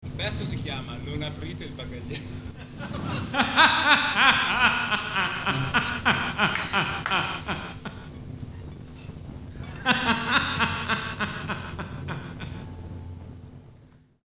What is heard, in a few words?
dice scream; 2007kindofdado